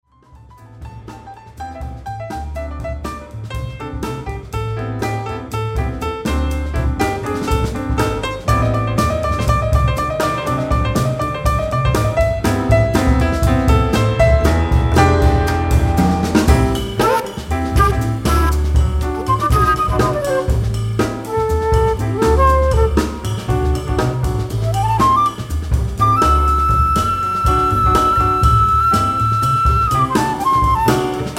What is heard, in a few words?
Spooky